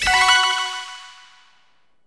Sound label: SE STAMP COMPLETE; maimai